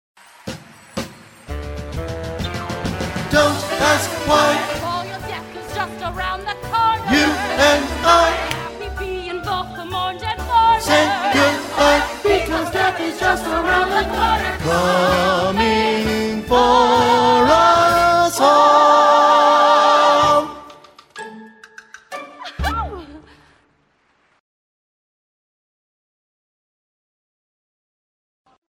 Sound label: bass playoff